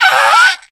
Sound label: rage, infected, male